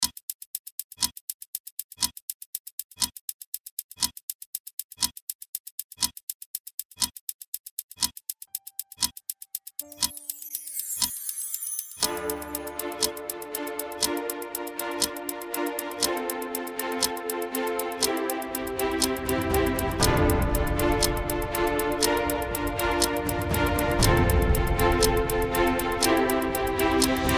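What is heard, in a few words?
Epic Inspiration New Year Countdown Version generic music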